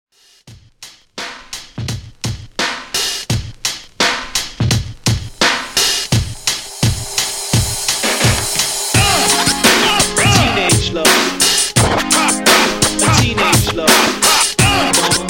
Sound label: 80s Hip Hop Dj Scratch Redrum 85 Bpm